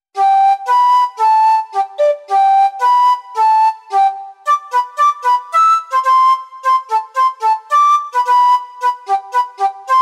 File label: Andante